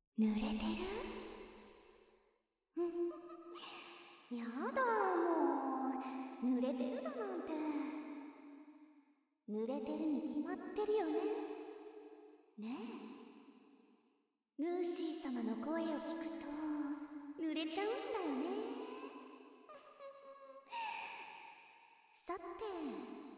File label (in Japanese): W耳舐めセックス; 夢囁き版